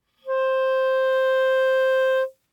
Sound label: Bb Clarinet ff C5